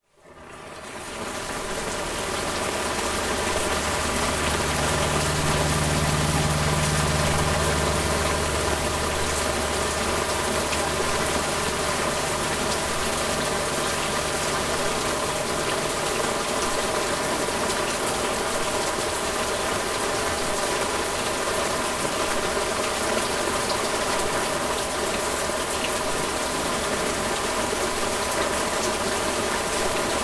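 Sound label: sound of rain; zvuki prirodi; shum dozhdya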